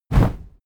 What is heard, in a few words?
cardboardbox soft